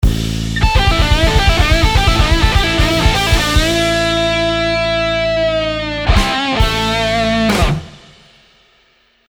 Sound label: ending phrase, guitar